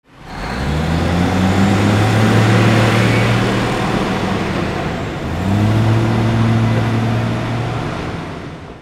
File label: VEHICLES